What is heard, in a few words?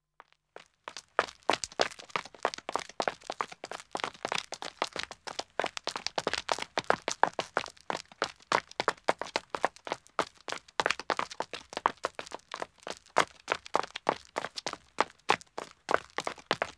Country Road Running 1 man, Footsteps; The BBC Sound Effects Library